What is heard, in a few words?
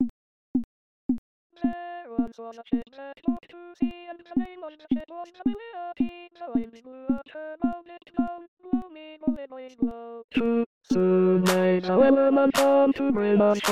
tenor